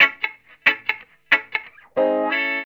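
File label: reggae electric guitar A; Best Service Advanced Media Trax Modern Composer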